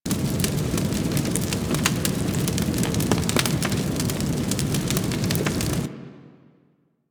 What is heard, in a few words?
Hub Fire Loop; Warframe; Tenno Hub